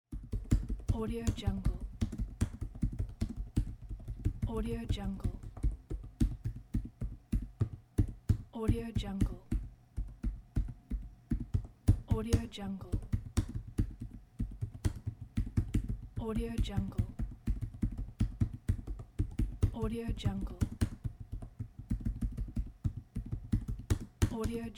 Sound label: keyboard